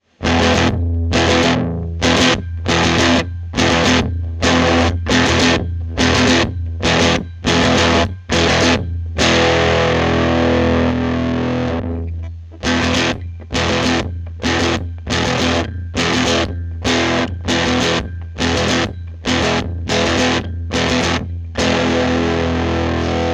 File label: RedFox Dist